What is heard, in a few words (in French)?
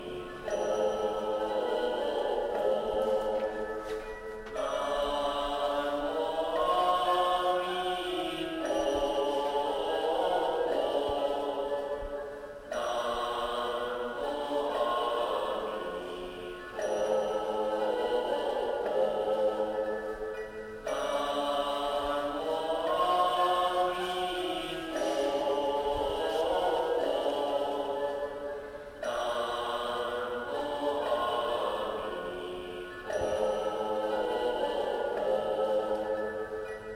Chine Emei Shan chant religieux